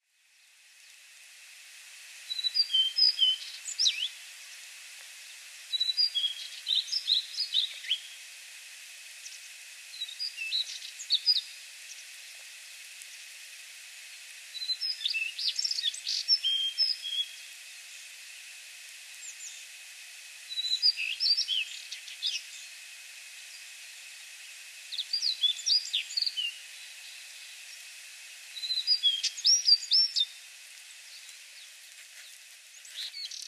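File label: Daurian Redstart; jobitaki; shigakogen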